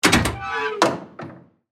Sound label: Metal Hatch Open, Scifi, Metal, Hatch, Open, Rocket, Ship, Door, Science, Fiction; Spacecraft Sound Effects; BlastWave